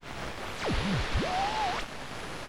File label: radio fuzz; Zaps and Beeps